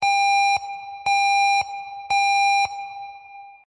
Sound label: evacuation tone